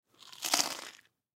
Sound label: Human Bite Food; Bite Food Crunchy Food; Eating; Sound Effects:Human